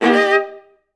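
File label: violas D; Best Service; Peter Siedlaczek's Advanced Orchestra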